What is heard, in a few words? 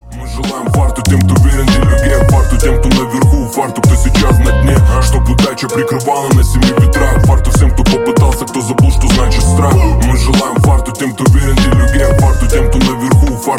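criminal beat, блатные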